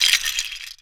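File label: debris small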